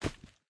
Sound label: stone impact; jewels